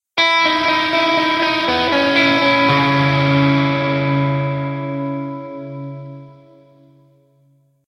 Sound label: Lose; Project Reality BF2 1.6